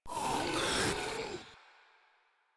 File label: Proto Infested Ancient Vocal Pain